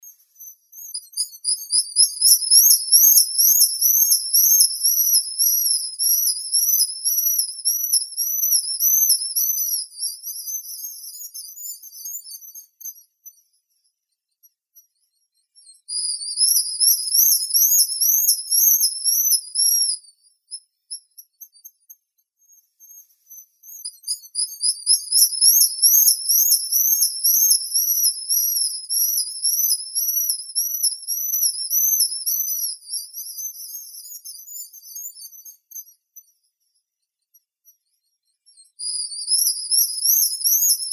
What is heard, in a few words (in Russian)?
chistik cepphus grylle